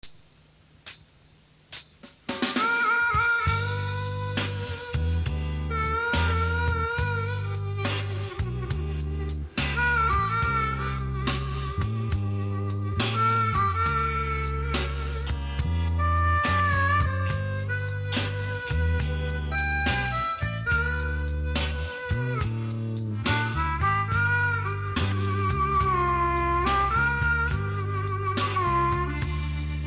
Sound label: harmonica; don baker exercises